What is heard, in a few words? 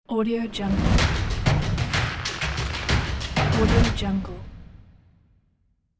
industrial logo